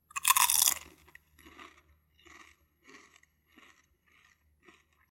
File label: chip crunch chewing closed; soundscrate